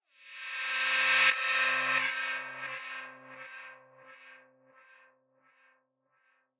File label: Swept Noise